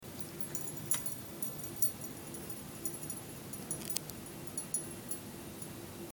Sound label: Oxide cracking; Sound of cracking oxide